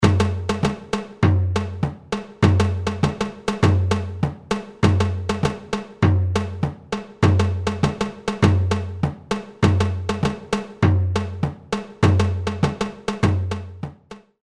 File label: tamborim sourdo